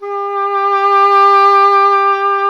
E. Horn

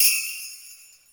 Sleigh Bell; Roland M-OC1

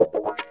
wah snip, Funk Gtr & Wah; PlatinumPhattCD2; E-MU Producer Series Vol. 8 – Platinum Phatt

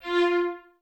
Violins Detache F 4; Miroslav Vitous; 11 Violins

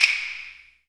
CLACK, JAPANESE

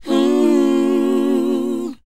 WHOA G#, Vocal R&B & Dance; ILIO; Vocal Planet

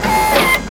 SAW; East West - Phat & Phunky

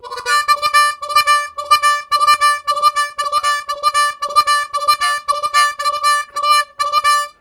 Trippy, Harmonica, WAV Riffs; Zero G

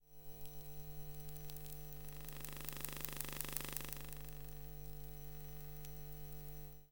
Electromagnetic Fields, Hummin; electric fields

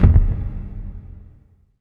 SHORT, SHORTNOIS; USB Soundscan vol.14 - Special FX Twisted

